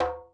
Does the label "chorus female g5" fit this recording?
No